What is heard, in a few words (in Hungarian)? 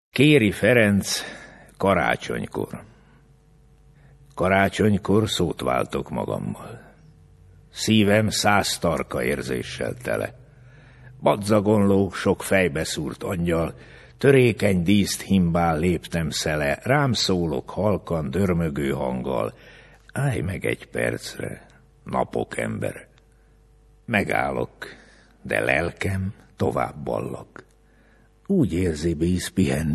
karacsonykor; hang villa